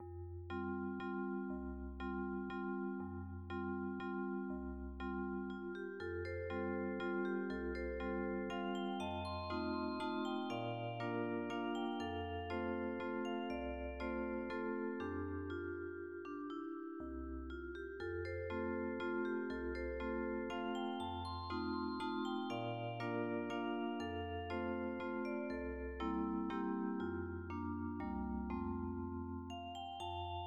ten walz

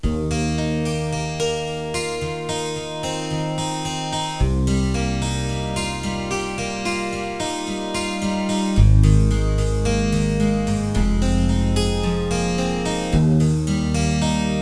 harm guit

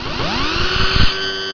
slide; robotron

